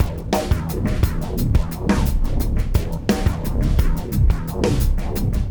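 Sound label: 87 bpm, beat loops; Zero-G; Monster Beats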